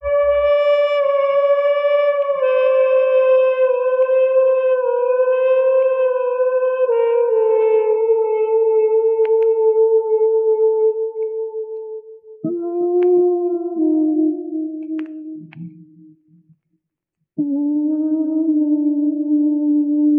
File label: sax wails, Sad; Loops